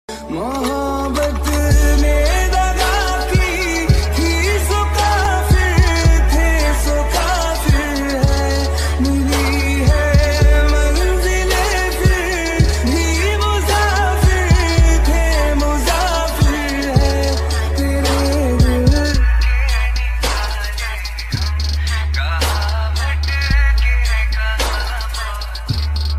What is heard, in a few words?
sad ringtone